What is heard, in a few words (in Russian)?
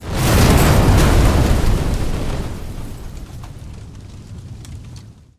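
взрыв на расстоянии вытянутой руки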